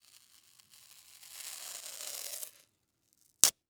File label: Emergency Band Aid Ripping Off Patient Slowly; emergency effects; AdobeCC